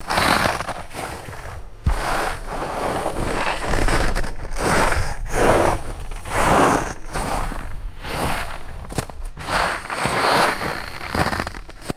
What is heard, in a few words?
kayak sliding and footsteps in snow